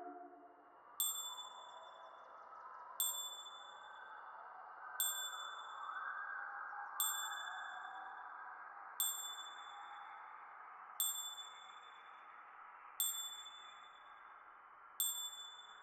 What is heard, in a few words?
120 BPM D Min Accents; Origins